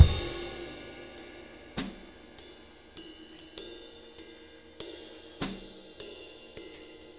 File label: 6/8 Loop, Drum Tools, Laid Back; Sonic Foundry (Sony Creative Software); Loops & Samples Drum Tools